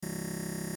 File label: Text Readout; Digital; Sound Design; Multimedia; Blastwave